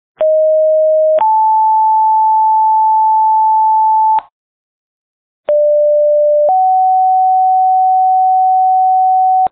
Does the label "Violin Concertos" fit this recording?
No